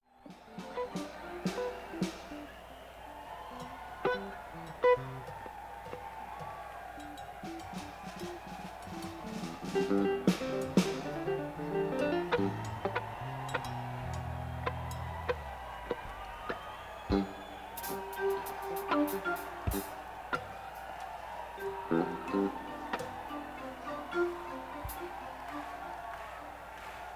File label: Tuning